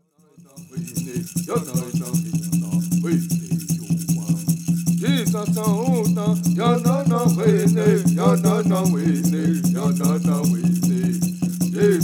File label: Ceremonial Song